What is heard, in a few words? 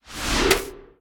Card Pack; gamerip